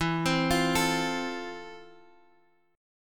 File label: F Major, guitar chord